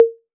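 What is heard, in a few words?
808 high conga, Tape 808, Conga; Goldbaby Tape808 & Tape909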